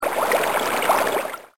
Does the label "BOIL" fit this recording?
Yes